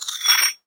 Hole Saw Scrape; Hole Saw Bits

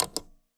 Typewriter

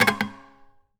Classical Guitar Scrape; Classical Guitar Extended Technique Stereo

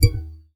Classical Guitar Extended Technique

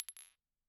Bottle Struck

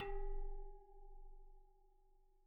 Bicycle Spoke

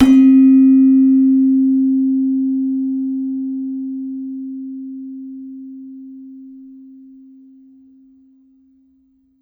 Gender, Gamelan

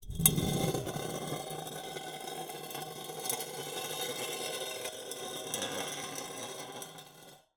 Drag, Vase

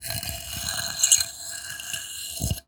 Wooden Bar Wire Brush

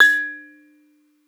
Bonang Para EQ, Gamelan

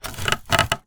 Polystyrene Creak

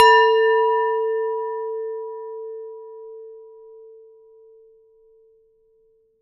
Hard Hit, Large Wine Glass

